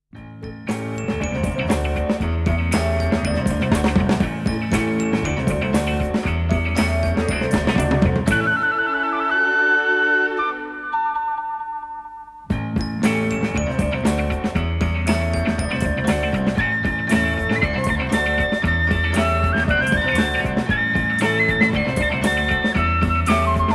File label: Piccolo Beat